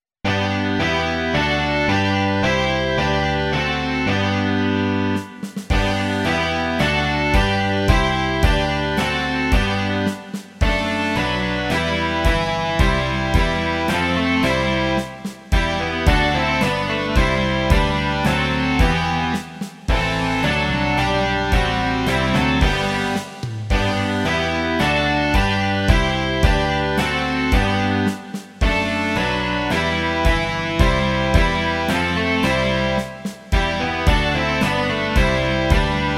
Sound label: rock